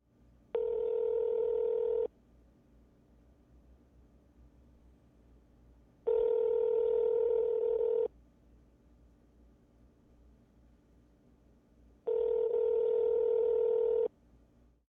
Cell Phone Ring In Ear

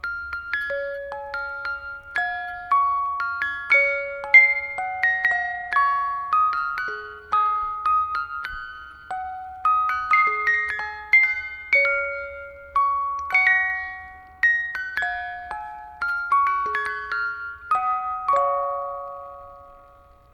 Musicbox Brahms Lullaby Loop

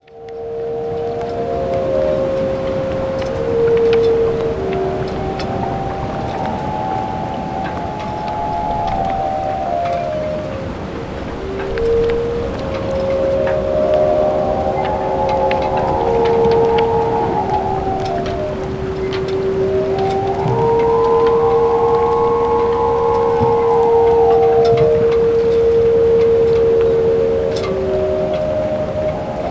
Mystic Melody

